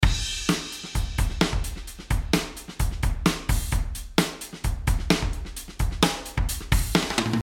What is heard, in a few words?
funkjazz, drums; Addictive Drums 2; Producer Pack; XLN Audio